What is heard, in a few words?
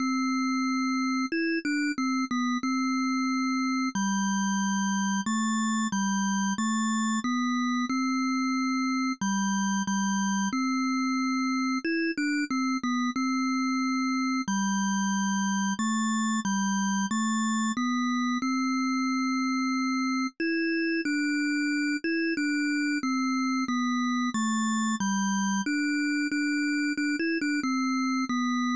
TAP; FM Towns Free Software Collection 9; crepas